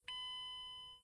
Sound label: car open alarm; svencoop; echoes